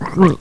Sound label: gurp; opentdm